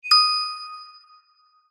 magic wand ping; zapsplat

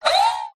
science fiction robot movement short burst

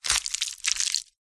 cartoon wet squelch sloppy movement

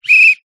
sports whistle soccer burst

